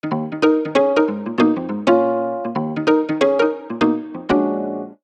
woody, ringtones; OnePlus 5T Stock Ringtones

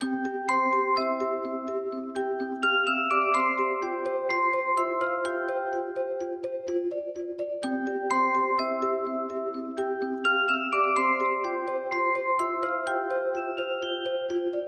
Starlight, ringtones; Galaxy S9

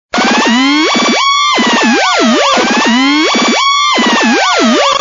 video game; Sound Effects Ringtones

